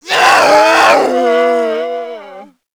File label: kid zombie death; nmrih; childrenofthedead; zerosiki; slender